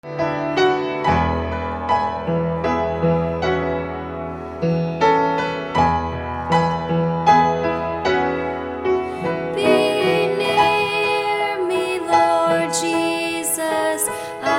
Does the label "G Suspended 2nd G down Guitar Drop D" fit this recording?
No